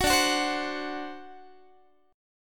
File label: D 7th, down, Guitar; strummed; 21,22,21,22,22,x